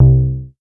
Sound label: C3, BASS; Q Up Arts; Dream Experience; BASS BANK 6